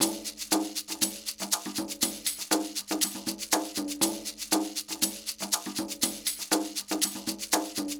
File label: 120 beat perc, Nu Jazz House; PowerFX